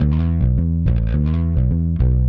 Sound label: Clinical Bass